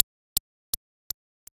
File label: frozen dino melt; Dinothawr